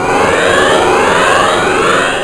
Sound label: alarm; quake2; zepsounds